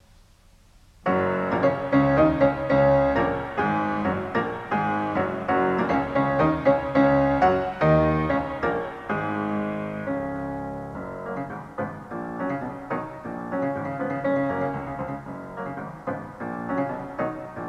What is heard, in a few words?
Piano, Smith Hall